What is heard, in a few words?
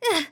damaged, RPG Voice; RPG Voice Starter Pack